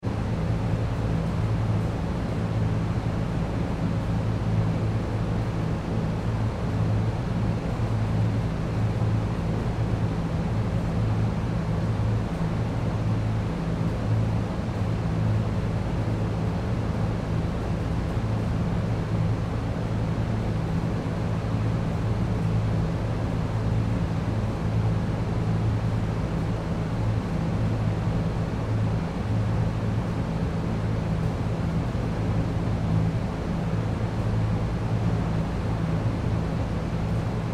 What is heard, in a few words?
Fan Large Parking Garage Vent Far; Machinery; Machines; Big Room Sound